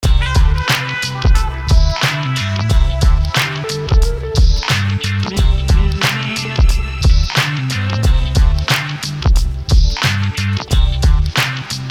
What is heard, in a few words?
Abstract HipHop; E-Lab; e-LAB MP3 Demos